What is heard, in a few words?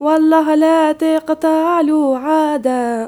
YOUNGIRL, Arabian Traditions; USB Soundscan vol.43; AKAI